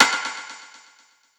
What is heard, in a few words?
perc ping pong; Drum One Shots; SD MIDNIGHT Sample Pack; Slate